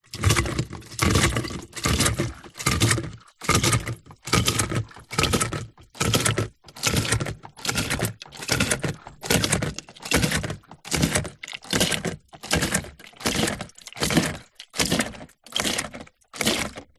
industrial pump siphon hand plastic squeeze squirt